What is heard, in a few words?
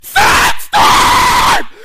sandstorm